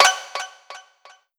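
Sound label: Sound Effect Battle 10 sec 10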